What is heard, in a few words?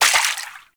Water Sprayer Surface Impact; Warframe